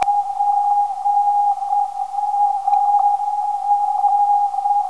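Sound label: 304kHz, non-directional beacon; PAR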